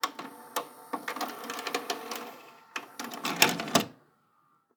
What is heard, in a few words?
VHS rewind takeout